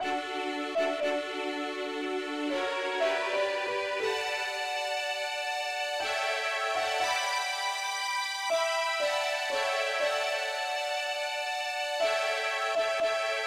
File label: mandolin Lead, key d